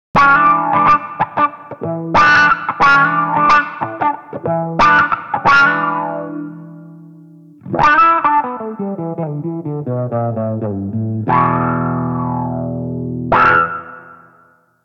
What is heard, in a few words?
Clean Attack